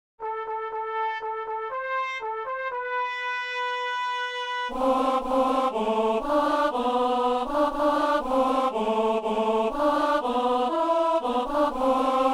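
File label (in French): chantre et ténor; Messe de Saint Paul; Alleluia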